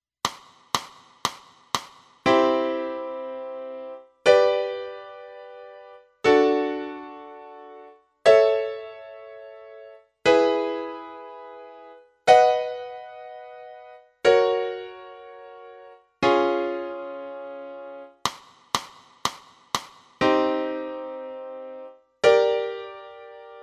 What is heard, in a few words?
piano